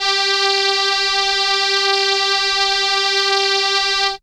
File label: Trumpet G4, ARP Solina; musicradar-synth-samples